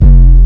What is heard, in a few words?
Boom Jungle; EMU MoPhatt